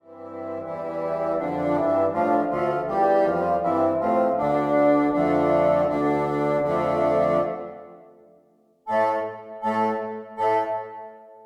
Traditionnel Kis kece lanyom La jeune fille en blanc 3 bassons

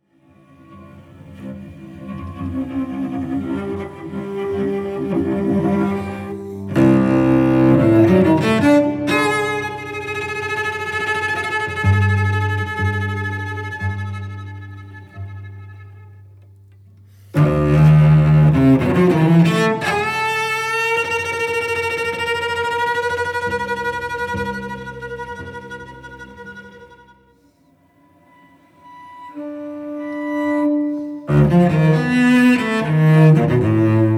violoncelle solo; Eclats op.24

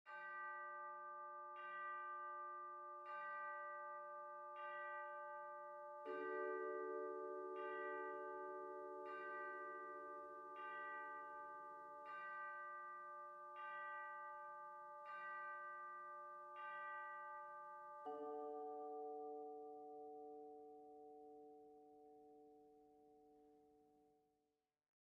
Danse Macabre percussions 2 pianos a 8 mains; extraits; Saint-Saens; ensemble de percussions

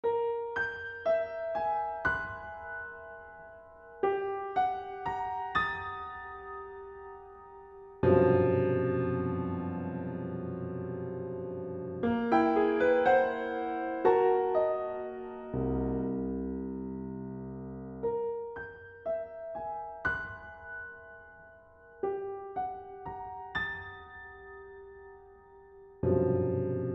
piano solo

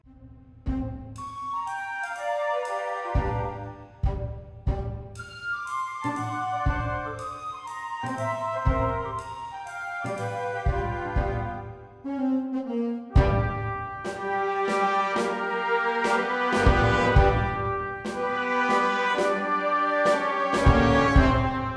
orchestre d harmonie

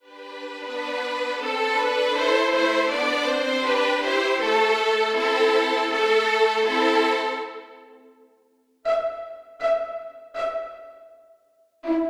3 violons; Traditionnel; Kis kece lanyom La jeune fille en blanc

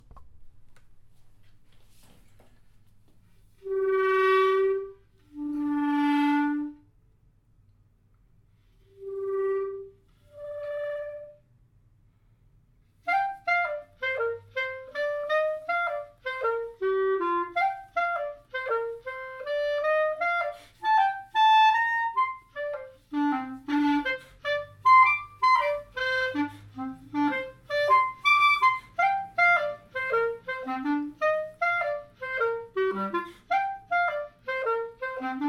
Rondo solo clarinette solo

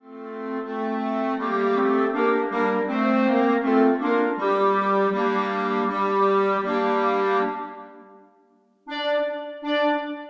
4 clarinettes; Traditionnel; Kis kece lanyom La jeune fille en blanc

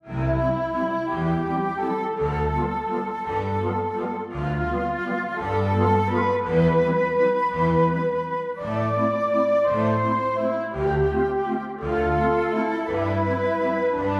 Valse flutes violoncelle contrebasse; Waldteufel; Les Patineurs

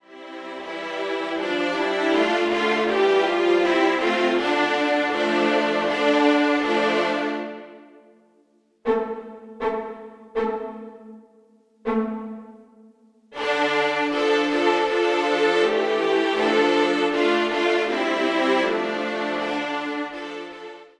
3 altos; Traditionnel; Kis kece lanyom La jeune fille en blanc

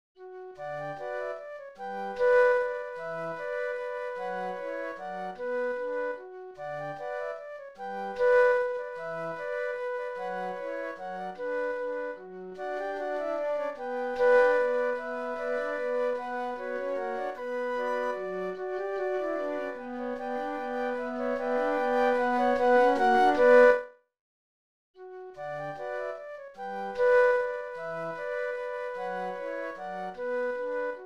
Valse ensemble de flutes; Serenade opus 22

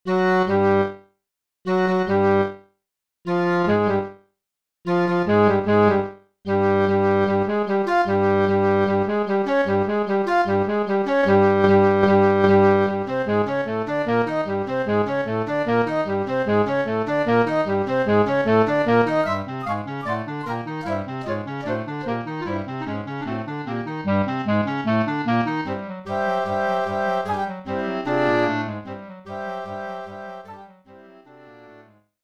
flutes clarinettes; Moussorgski MP; Tableaux dune exposition; Baba Yaga

